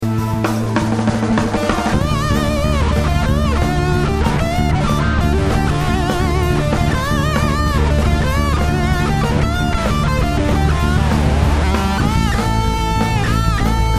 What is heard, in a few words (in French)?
extrait solo guitare